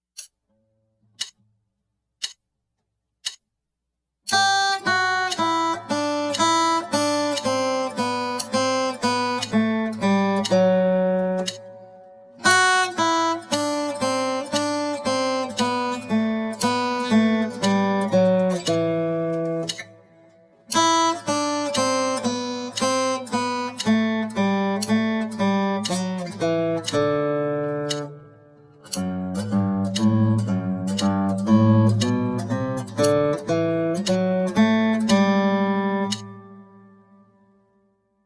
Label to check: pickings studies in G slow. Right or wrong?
Right